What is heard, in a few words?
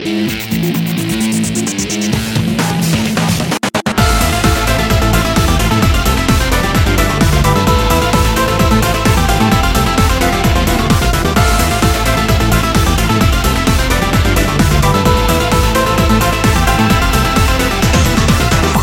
electro sound